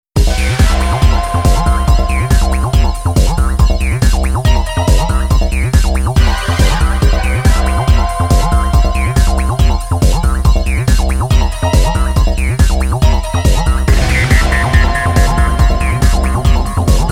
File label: techno